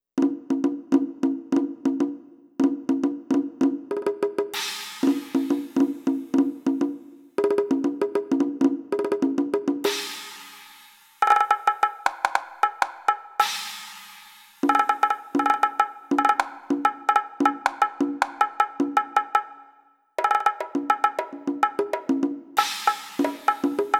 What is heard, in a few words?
perc 100